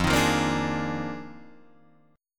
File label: F Minor 13th, Guitar Drop D